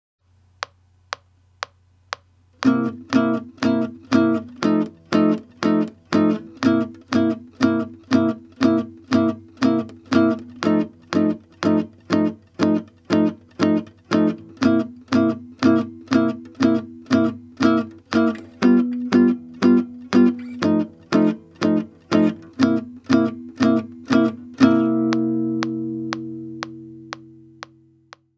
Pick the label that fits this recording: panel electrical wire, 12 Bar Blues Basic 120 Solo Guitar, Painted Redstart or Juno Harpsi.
12 Bar Blues Basic 120 Solo Guitar